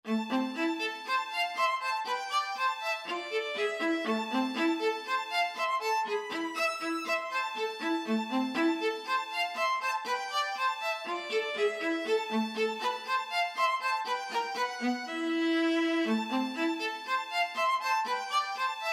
violin viola duet

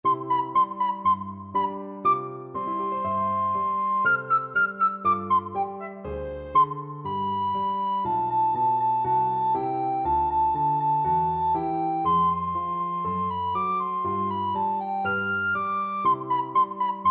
recorder

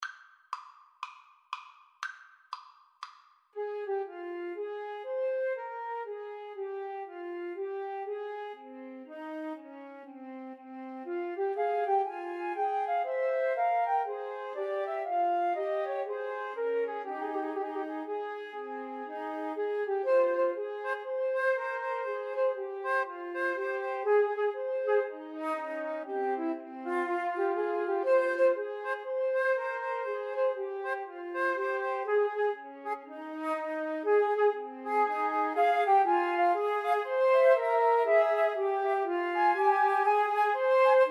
bach bouree, Flute Trio

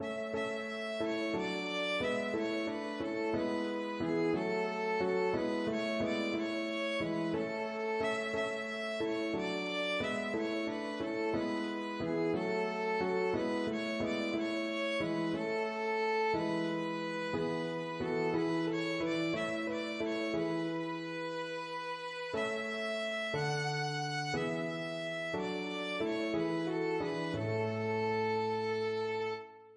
violin

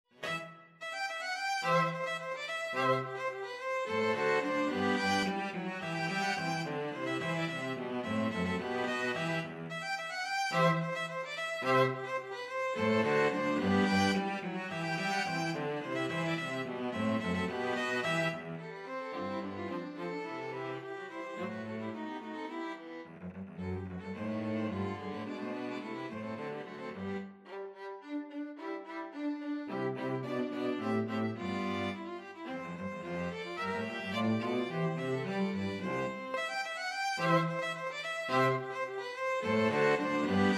string quartet; Fossiles

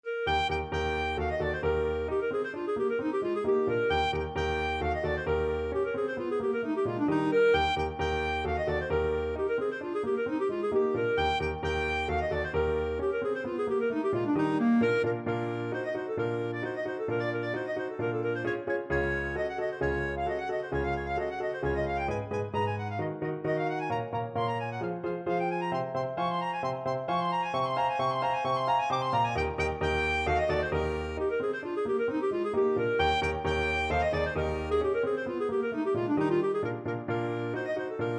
clarinet; rigaudon

